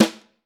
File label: Vintage Snare Drum